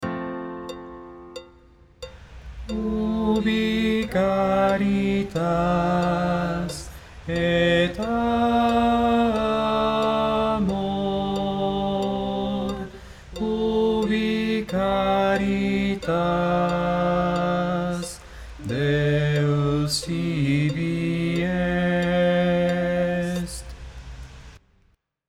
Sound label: tenor; Ubi caritas